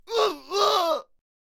Death Vocals; Berserker

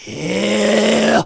Samurai Attack Vocals

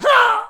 Clubber Death Vocals

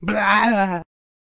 Death Vocals; Stoner

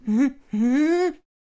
Blowdarter Attack Vocals

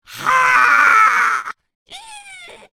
Attack Vocals

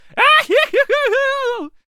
Lasso Attack Vocals

